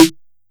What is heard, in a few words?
SNARE